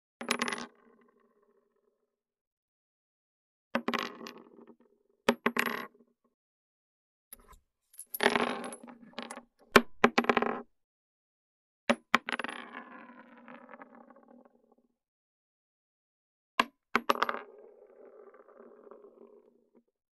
Bullet Drops To Wood, foley gun movement

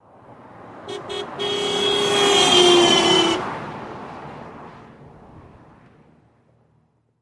Car Import Doppler Ho, doppler horn bys

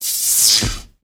Elect Arc Slw Rise, arcs & sparks

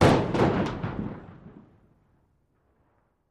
4 Oz Concussion Hit M

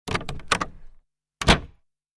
Carriage Door, carriages

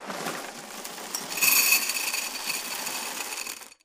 cereal box pour

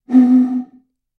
Blow Pipe, bottle

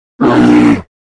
Bear Grizzly Growl

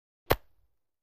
Golf Club Hits Ball

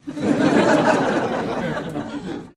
Laughter Med Crowd In; medium

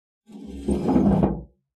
metal gate latch; latches & bolts

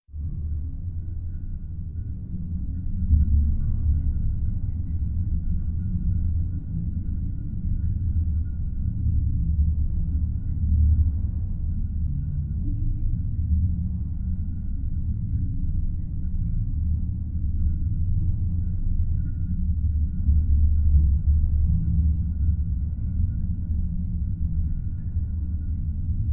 Low Underwater Amb, ambience

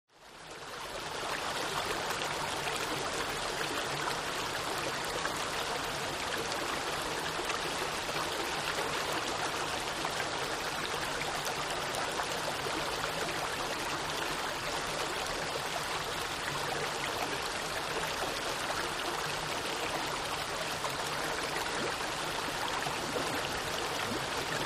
Water Overflowing Stre, rivers

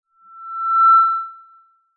Microphone Feedback

